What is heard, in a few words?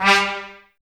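Brass trumpets G, Mute; Miroslav Vitous - Woodwind Brass Ensemble; Tps Mute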